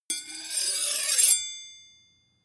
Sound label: sword blade grind ring